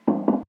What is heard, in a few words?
Step